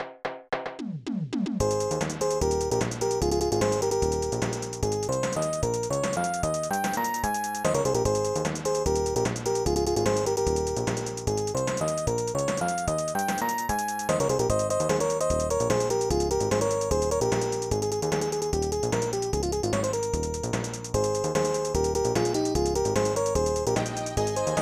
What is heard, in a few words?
In-Game Music (Faster); Keeper; snes